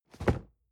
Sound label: cardboard box medium fall low soft; objekte